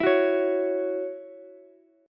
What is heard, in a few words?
C sharp Minor Double Flat 5th C sharp Ukulele; C#mbb5; strummed; JGuitar